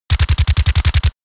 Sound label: MP5 submachine gun short burst; war